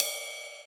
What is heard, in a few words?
Ride Bow